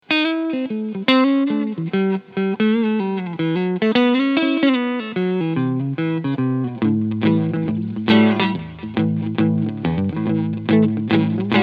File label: Fender Traditional 60s Tele Cleansound Neck Pickup